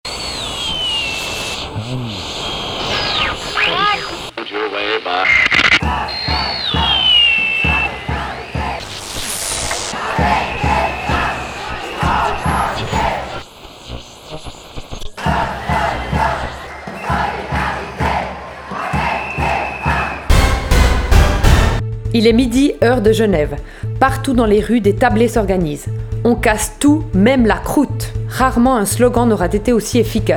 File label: PAD; Grand jour 12h; Caravane contre les féminicides - Quai du Bas 30, Biel/Bienne